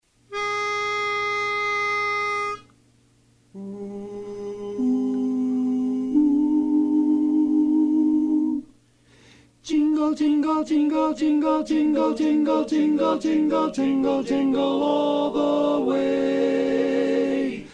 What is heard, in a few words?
Tenor